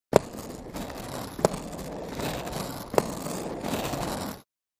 Roller Blading

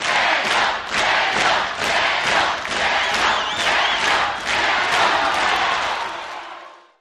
Crowd Chants "Daniel"

